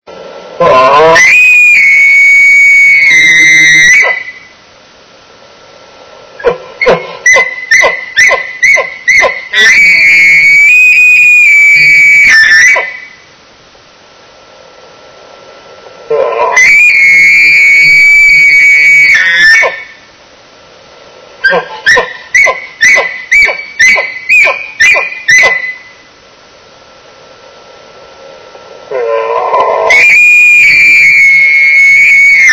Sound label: bull, elk; elk 2001